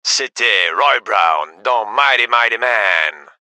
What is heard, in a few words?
music